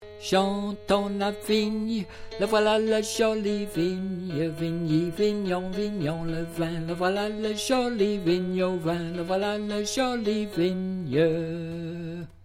tenor

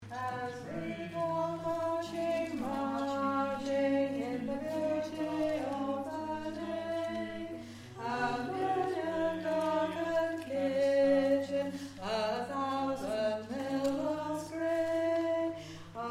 Alto

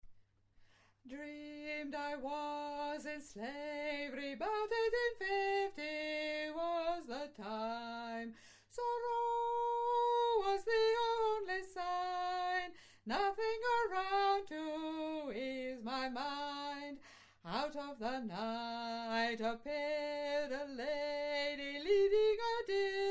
solo alto

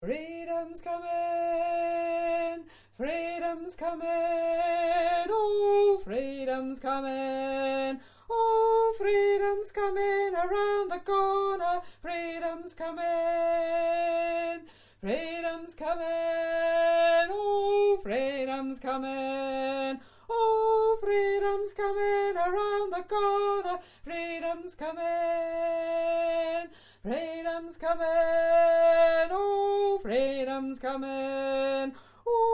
solo alto

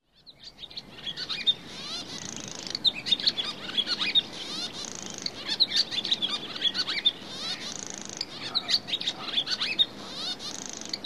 Swallow, barn; Hirondelle; bbc Bird Song Site

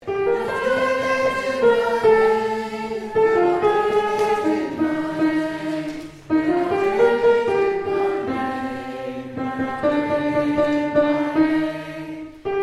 Chorus Sop

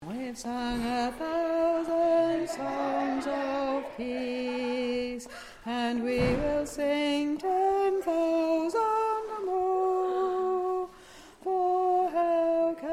Alto

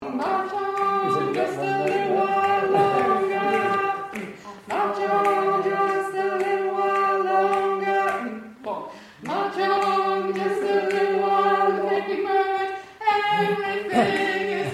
tenor

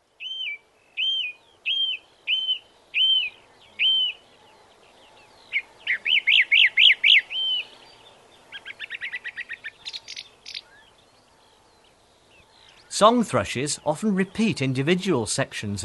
Song Thrush - Grive; bbc Bird Song Site

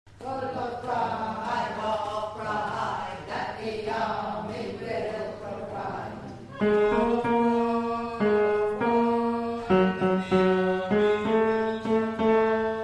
Tenor

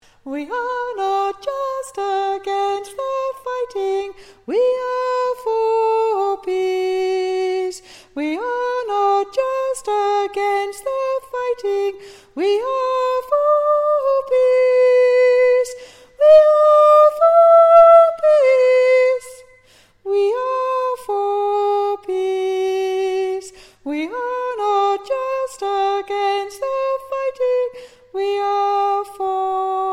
tenor

